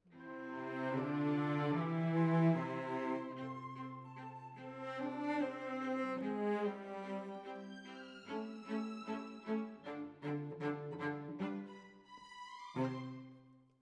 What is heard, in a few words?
Cellos